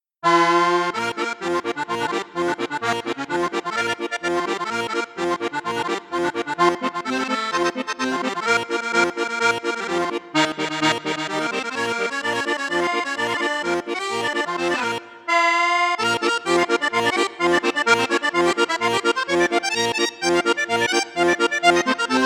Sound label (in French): registre basson flute juste flute haute, accordéon; coope armoniche diamante 805